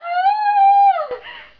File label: SCREAM; Scream female